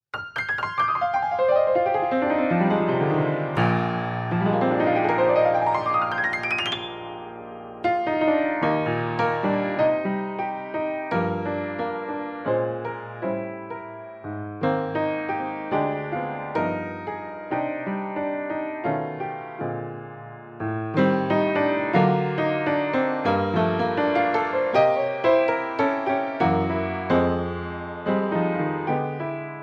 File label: Rain Piano